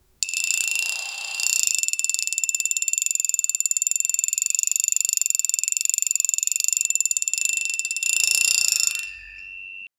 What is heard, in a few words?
fishing rod